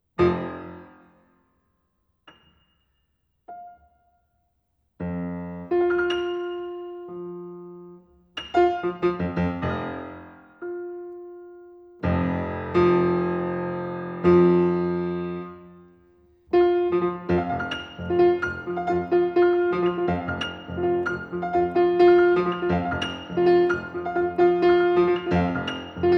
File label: Prelude and Waltz in F, Piano; Játékok – Games IV.